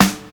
good snare sample g key, acoustic snare sound tuned to the G note